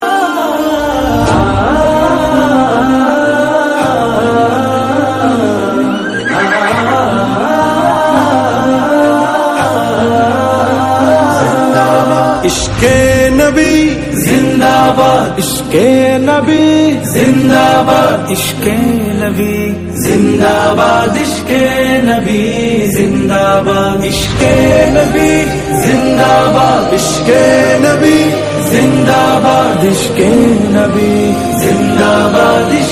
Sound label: BEAUTIFUL ISLAMIC RINGTONE